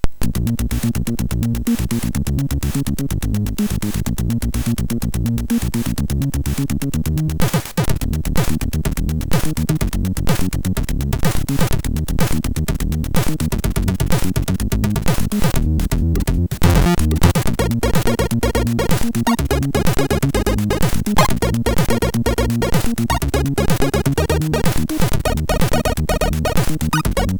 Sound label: Bomberman C64, MOS6581R4